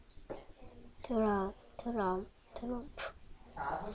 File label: drum